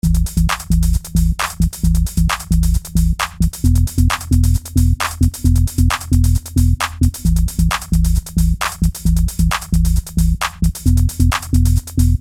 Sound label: Beat Smooth Bass Sweeper Melody; Miscellaneous Loops